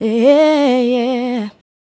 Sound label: Female Vocal; Vocals; Sonic Foundry (Sony Creative Software); Mac Money